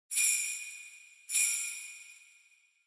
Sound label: Sleigh Bells Twist Gentle Short